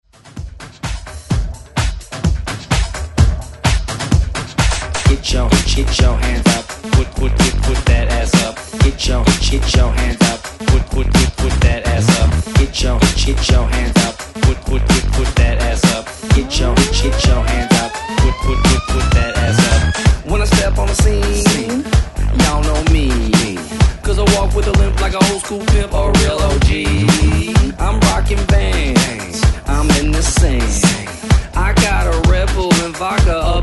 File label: Hype Re Drum 127Bpm; DJDOLLS